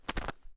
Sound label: angelic defeat cloth; Smite; Thanatos